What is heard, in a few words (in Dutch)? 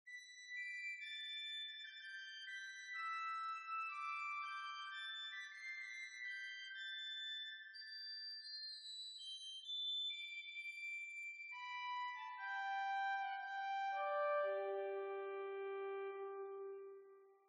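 octaaf 8, orgel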